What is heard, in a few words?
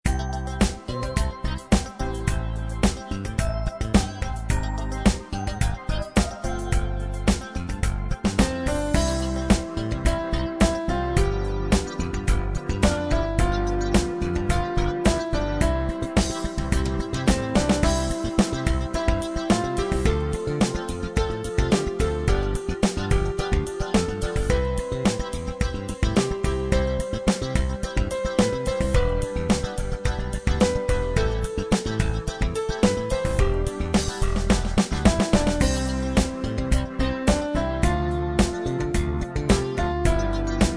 funk